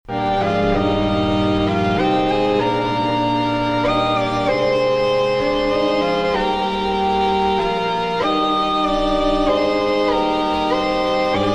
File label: Organ; Xmas Music; Organ Control For Automatic Light Display; 1954; lecaine; Compositions Demonstrations; Le-Caine Hugh